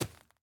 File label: grass, walking; factorio 2.0.55